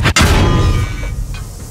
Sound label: beat